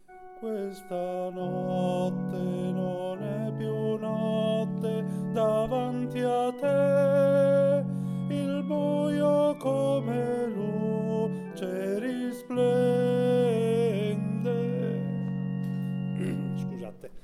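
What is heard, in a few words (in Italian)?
Questa Notte Non E piu Notte, tenori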